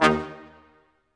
BRS Quintet staccato, Symphony Orchestra; Roland LCDP08

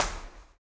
STOMPS; Spectrasonics; Vocal Planet; Blues Soul

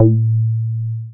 BAS SH-101; Best Service Gigapack 2

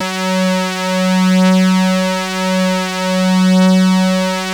BROOMSTICK; Masterbits; Vintage Voltage

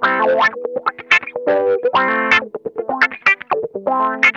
WASPISH, Funk Guitar; Zero G - Funk Guitar

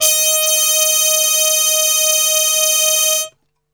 D#3, Brass Super Section; Best Service; TRPHARMUTE

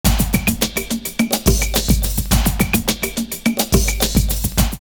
106, drumloops, Rave; X-Static Goldmine; 90 s Sample CDs

